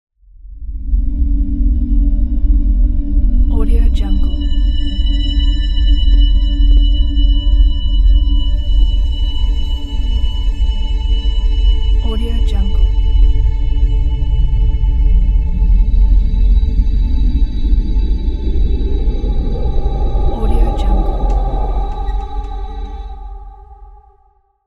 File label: tense atmosphere